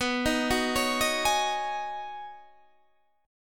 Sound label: B 6th Add 9th B, chord; B6add9; arpeggiated; 19,18,16,18,16,16